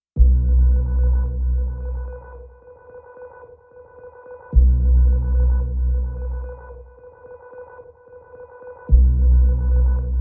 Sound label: electronic music; Mr. Robot, Vol. 5